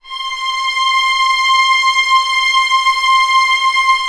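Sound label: STR C5 FF, Orchestra Strings; Sound On Sound - Peter Siedleczek's Orchestra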